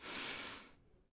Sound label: sniff long; Civ4 warlords